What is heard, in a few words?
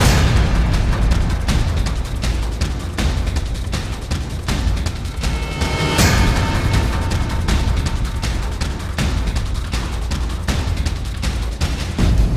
Action Horde; Cabela's Dangerous Hunts 2013; PS3; Wii; Xbox 360